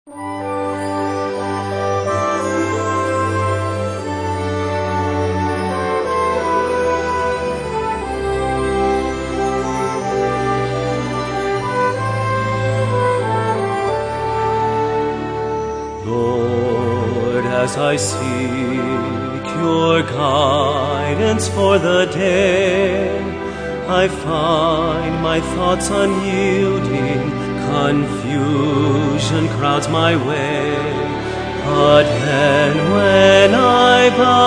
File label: Singers With Orchestra